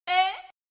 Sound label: eh sound effect